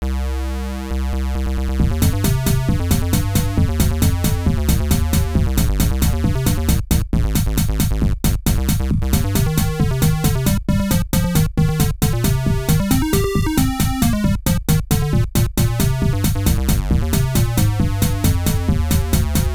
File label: chiptune; ludum dare 40